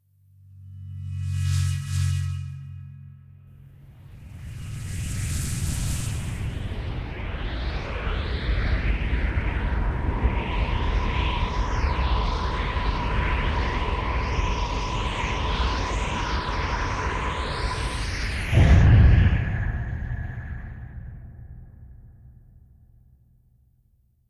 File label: dragon Breath; Keepsake; cutscenes